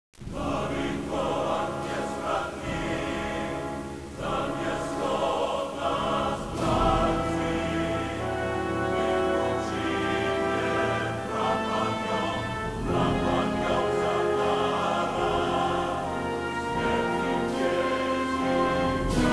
music, ambience

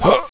pain, bot; cooked

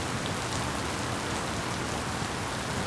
rain

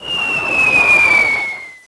mortar hit; chicken fortress 2

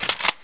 kriss draw

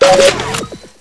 item use; nst zombie

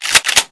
spas12 pump

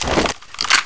ana foley

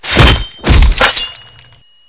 knife stab; nataknifed

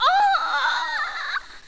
f die; nst player

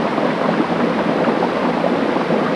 waterfalls; zs culvert

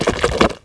coke shake

